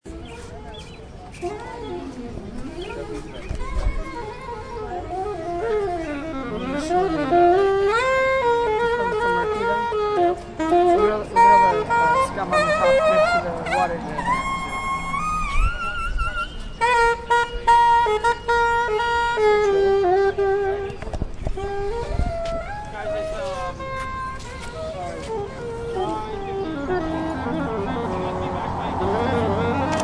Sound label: Sax in central park